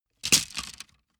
wood sticks small break

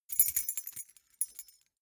key ring small rattle air long

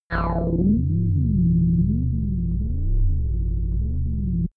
warp core

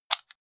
socket pull plug close

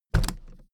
suitcase put down soft

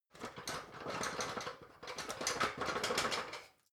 ladder metal rattling long

